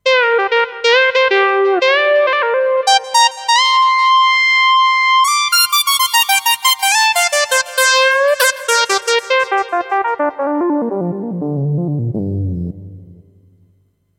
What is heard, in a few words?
FM Syn Lead